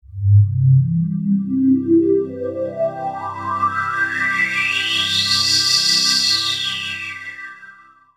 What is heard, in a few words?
Digi Pads; Jungle Frenzy 1